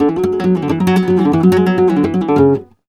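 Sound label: Flamenco Guitar phrase c#; Best Service ProSamples vol.46 - Flamenco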